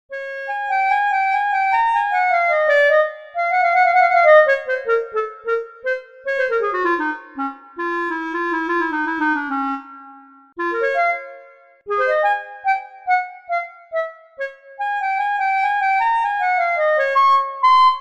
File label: Clarinet